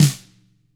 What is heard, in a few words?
TAMA SNRD, TAM FLAM; ILIO; Double Platinum Drums 1